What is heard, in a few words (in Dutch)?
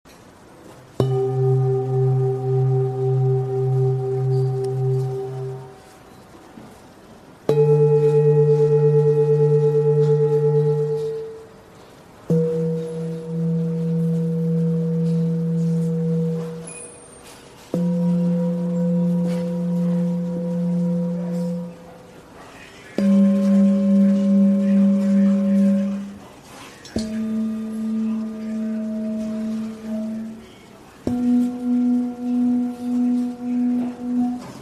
7 tibetaanse volle maan klankschalen; 17 26 cm handgemaakte set